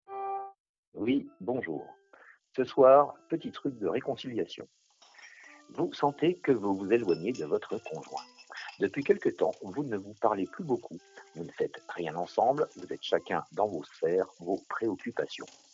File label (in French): orguemix